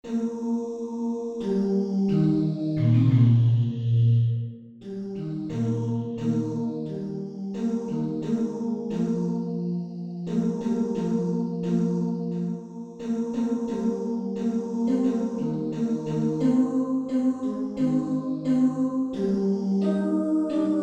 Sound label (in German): Tenor